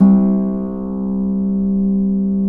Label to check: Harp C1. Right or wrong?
Right